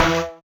marimba steeldrum; ゆめ2っき

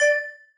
marimba; ゆめ2っき

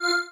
marimba spacesuit; ゆめ2っき

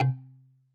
marimba kalimba

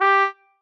marimba trombone; ゆめ2っき

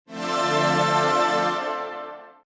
wind hoo

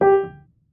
marimba piano; ゆめ2っき

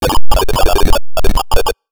Robot Talk

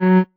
marimba; ゆめ2っき

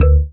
marimba raincoat; ゆめ2っき

